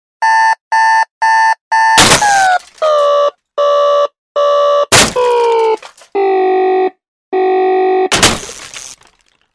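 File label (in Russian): звуковой эффект разбитого будильника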